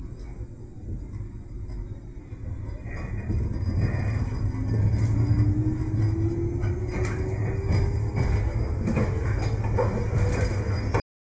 Motor, Train; OpenBVE

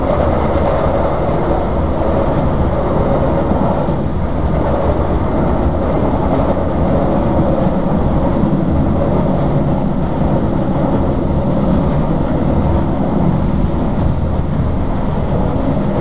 run, Train cl158; OpenBVE

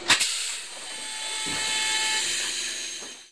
Door Open, Train; OpenBVE

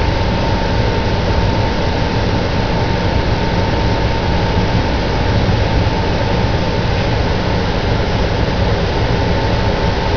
Motor, Train; V43 Szemely

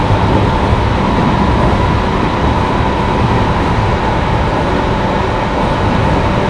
Run, Train; OpenBVE

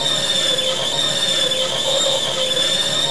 Rub, Train; OpenBVE

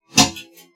AC click, Train; OpenBVE; Cl313 Silverlink

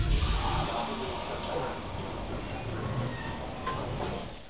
Air Zero, Train; OpenBVE

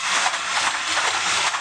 Run, Train; OpenBVE

